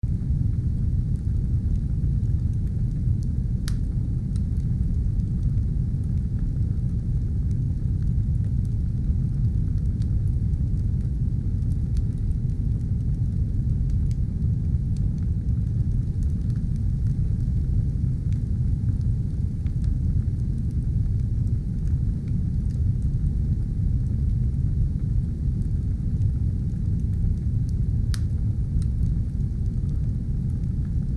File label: 火炉 stove fire,白噪音 white noise; Xiaomi MIUI 12.5 China; MIUI12.5系统音效全收录